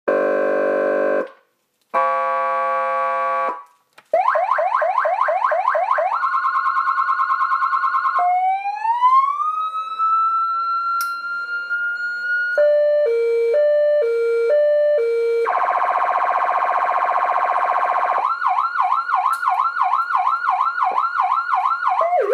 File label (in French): Sirene